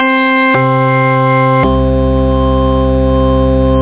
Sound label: Church Organ